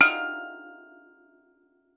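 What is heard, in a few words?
STEEL DR, Steel Drum Lead Pan, Pitched Percussion; E-MU Producer Series Vol. 7 – Old World Instruments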